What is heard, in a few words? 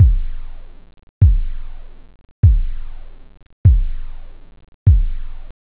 classic, techno bd, drums